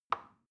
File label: plastic button tiny release soft